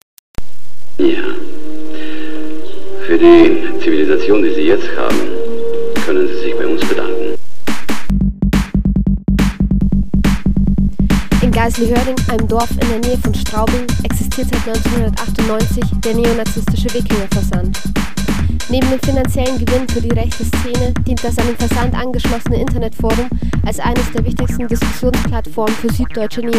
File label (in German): jingle; Geiselhöring; Wikingerversand